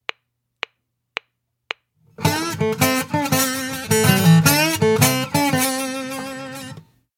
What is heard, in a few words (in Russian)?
Слайд гитара